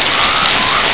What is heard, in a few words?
Unknown sp Sound